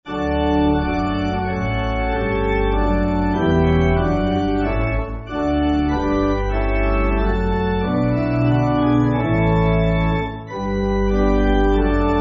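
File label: Pipe Organ, Backing Files for Churches; You Came From Heaven; Clark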